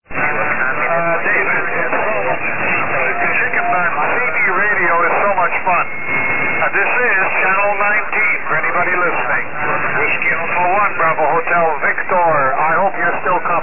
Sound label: cb radio